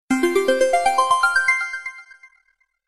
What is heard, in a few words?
samsung galaxy a50 ringtone